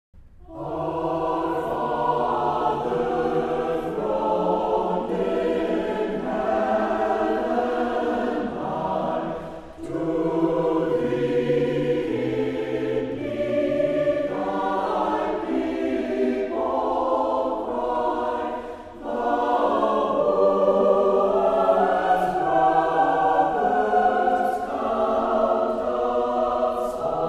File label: Choir, organ